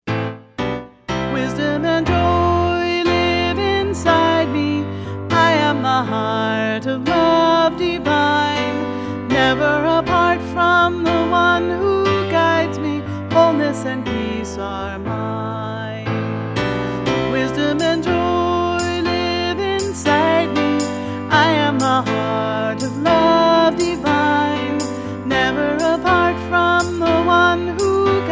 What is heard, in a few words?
Joyful Chant